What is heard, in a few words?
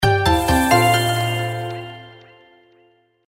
outro sfx; Imperial Girls